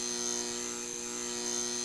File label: l hum; tfc